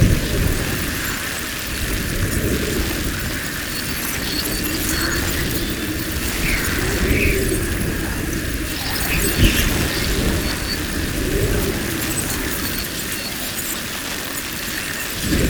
Icy Cloud Loop; Warframe; Moa Pet; Cryotra

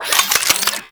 Modular Secondary Handle Fast Reload, Guns; Warframe; SU Modular Guns; Reloads

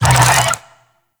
Infested Big Ashen Vocal Pain; Warframe

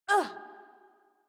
Generic Short Pain Operator Voice

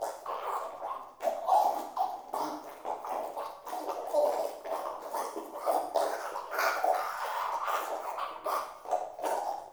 Infested Eris Interior Critter Loop

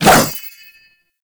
Sword Swipe; Warframe; Duviri; Dax; Weapons

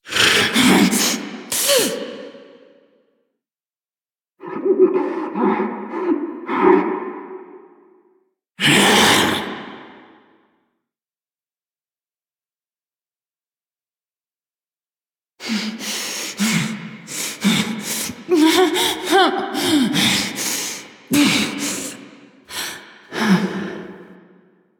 Exertions; Good End; Eleanor; Warframe; Hive Queen; Cinematics

